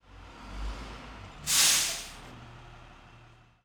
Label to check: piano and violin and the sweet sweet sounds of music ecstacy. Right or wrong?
Wrong